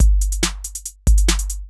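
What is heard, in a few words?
DRUMLOOP ELECTRO 140; Techno2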